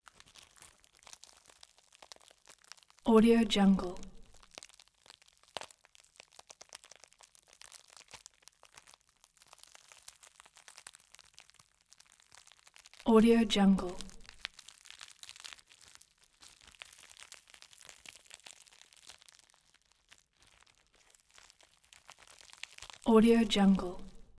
gore slow rip; collanderson

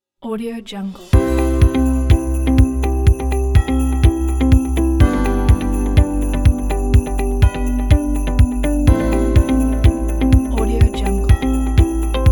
corporate christmas

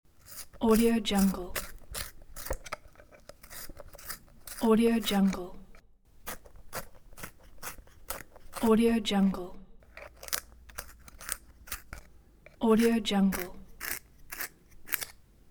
pencil sharpener

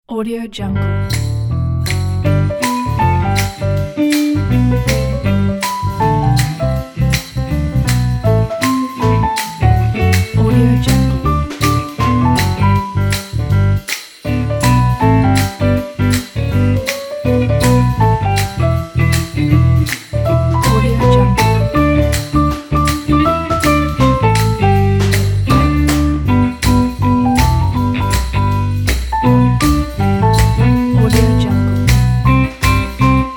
jazzy jingle bells christmas

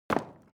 object wood drop indoors; smartsoundfx

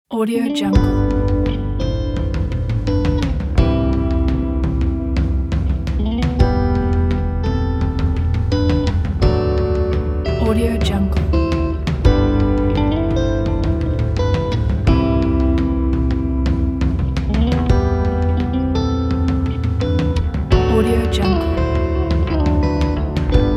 reflective hopeful happy nature

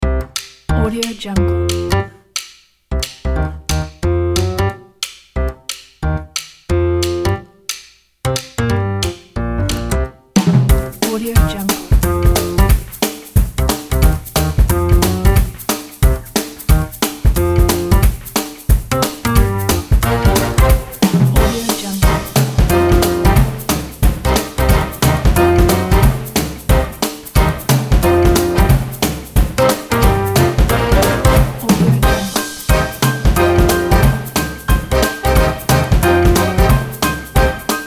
big band swing

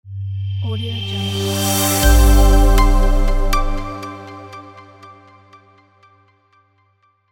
intro corporate logo

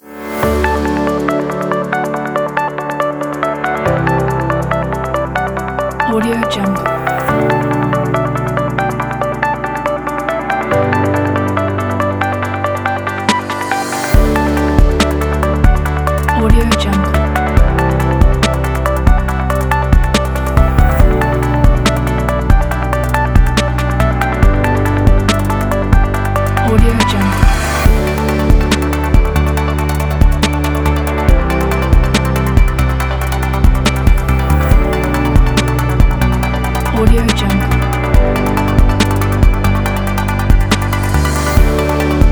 abstract inspiring technology background

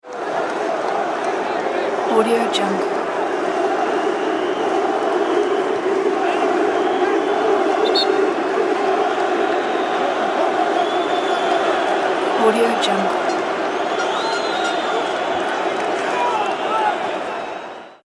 football supporters strong singing

